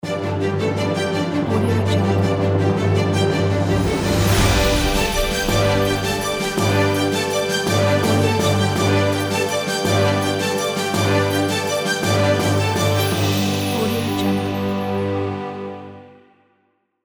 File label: awards logo intro